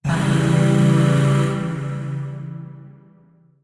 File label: Shawzin Phrygian Chord; Warframe; Zariman; Void Shawzin; Chords